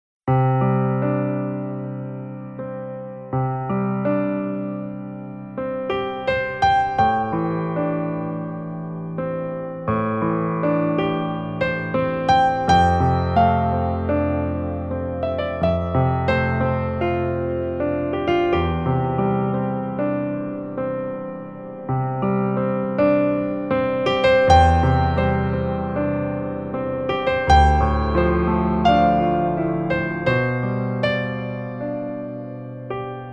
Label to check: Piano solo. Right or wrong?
Right